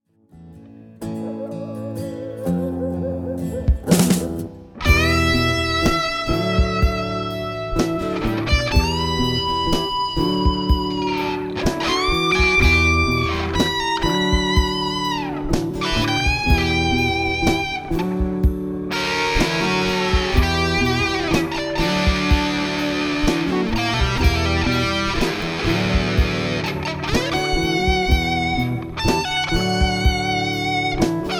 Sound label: Dry Solo